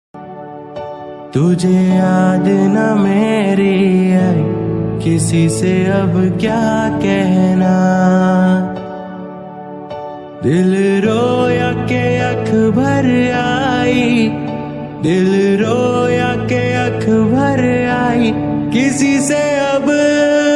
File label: Best Hindi Ringtone